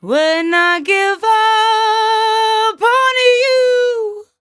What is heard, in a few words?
57bpm Am, Velvet Vocal; Zero-G